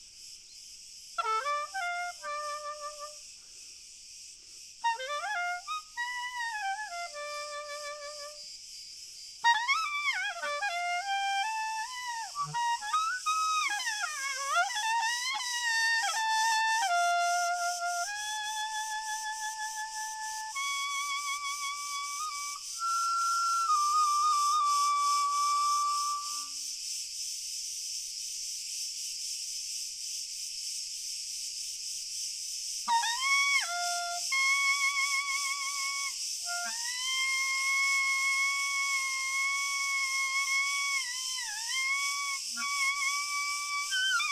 Grillen Chor Fern

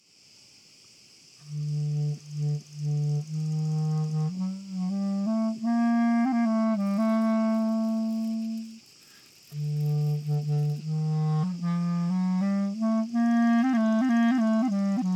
karidi Stand Variation

